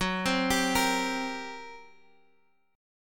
G Minor Major 7th Double Flat 5th, F sharp

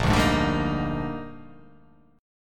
Bb 13th; Bb13 strummed

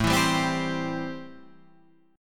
C 6th A, chord

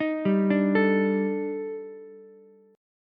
D sharp Major Sharp 11th, Ukulele, C6; arpeggiated; 8,7,10,12; JGuitar

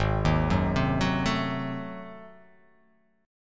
G sharp 7th Flat 9th G sharp down, chord

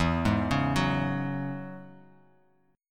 E 6th Add 9th E down; E6add9 arpeggiated; JGuitar

